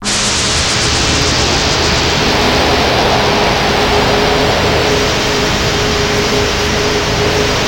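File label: G#3 noise; Northstar; The Wizard VOL-1; MYSTERY BOX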